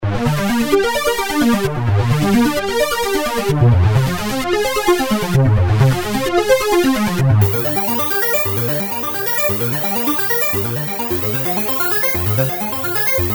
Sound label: Arpeggiator